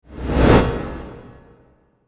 swish swoosh woosh, door; stereogenicstudio; seeding-planets

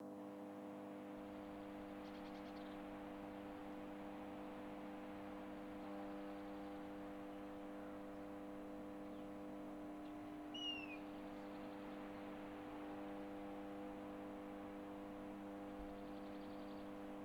truck ambience; seeding-planets

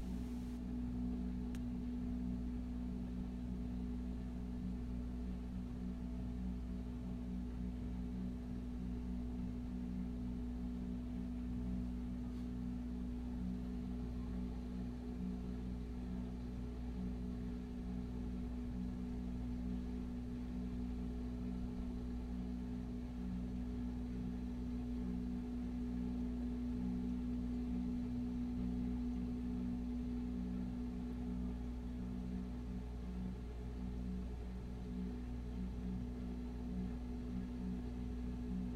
ambiance; Demeter; dialogues

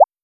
recharge capsule; seeding-planets

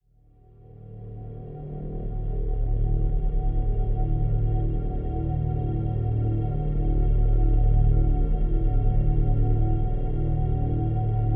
ambiance; astra; seeding-planets

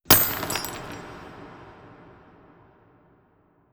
pickaxe cave final blow; seeding-planets

